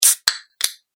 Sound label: food drink can tab pull open; Can Of Drink Being Opened With Tab; Soda Can Open; Soda Guns; McKinney Sound